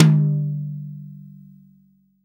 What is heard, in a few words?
TOM, REAL DRUMS, SWING KIT